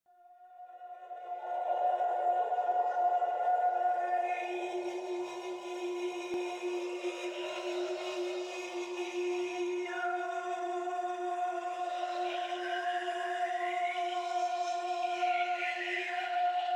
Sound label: Choir; Warframe; DE; Sentients